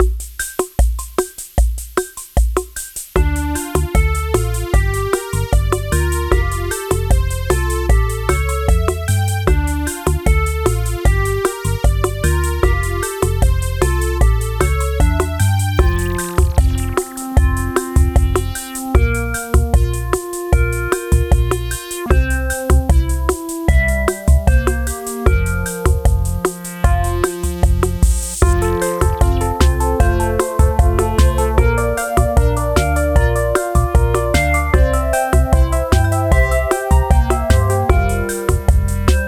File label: Arp Arc